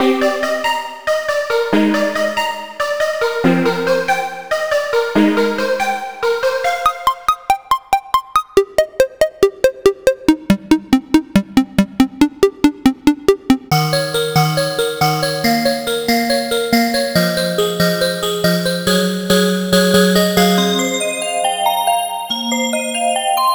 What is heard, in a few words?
Zebra Presets Future 808s